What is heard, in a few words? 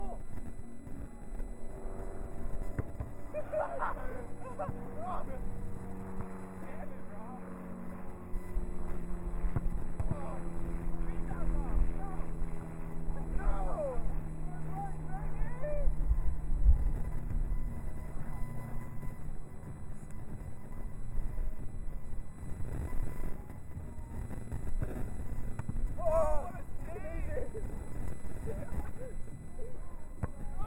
green beach soundscape